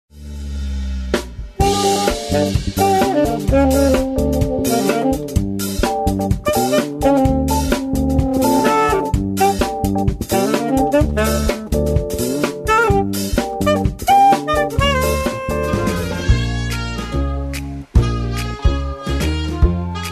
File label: sax electroswing, Female Solo Saxophonist